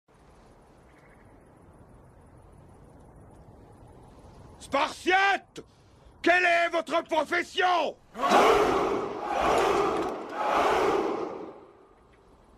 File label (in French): CRIE DE GUERRE SPARTIATE; 300